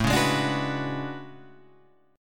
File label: A Major 13th, down Guitar Half Step Down